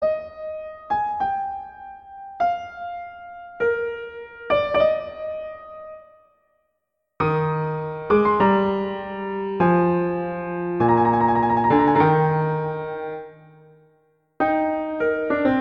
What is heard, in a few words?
Largo; Telemann; Canonic; Sonata 6